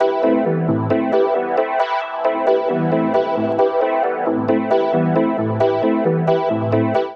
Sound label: E Major 134 bpm, pads; MIDI Clips; Tonal; Cerebral Washout; Skitter and Step; Ableton Factory Packs